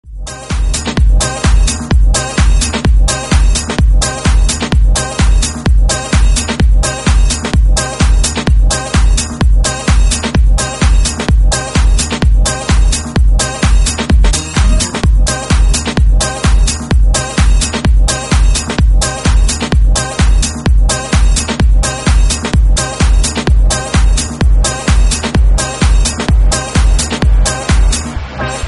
Disco Groove

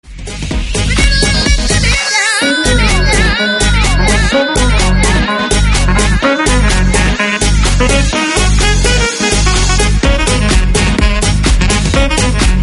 Blow Your Sax

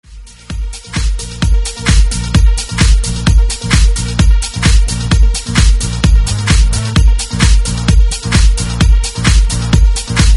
Disco Heat

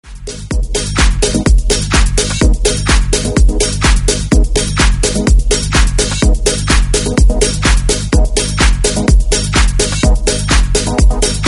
Blow Your Sax

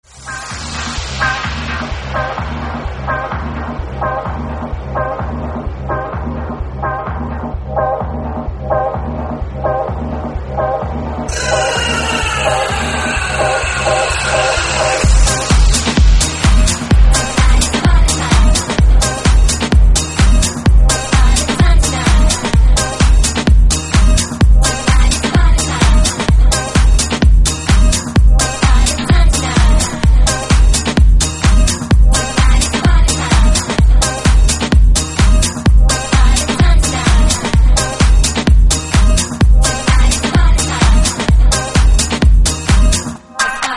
Disco Groove

